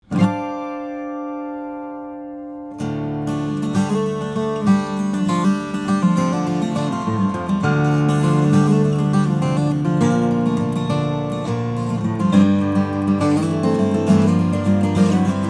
k65ce, steel string guitars flattop; taylor

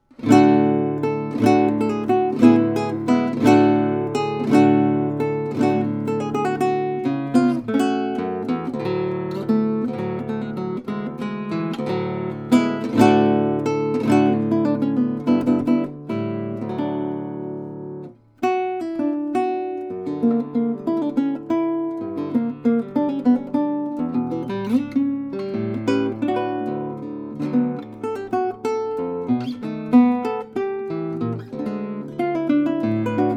zimnicki concert, nylon-string classical guitar

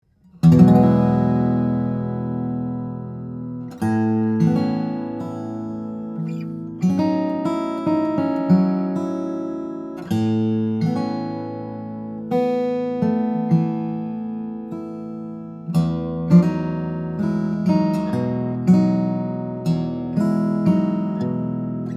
Brazilian Rosewood/Carpathian Spruce, guitars; traphagen; 000-12 BRS; Dream Guitars

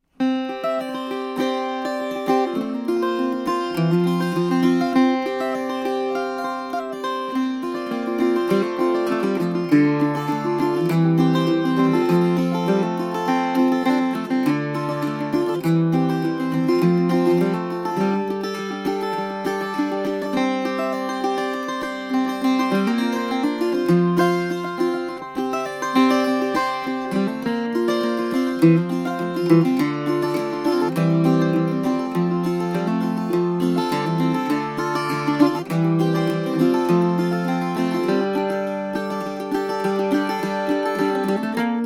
steel string guitar flattop; veillette gryphon 12-string high tuned 12-string

